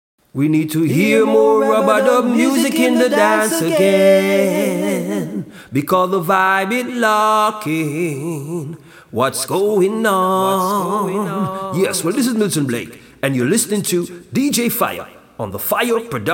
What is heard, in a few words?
JINGLE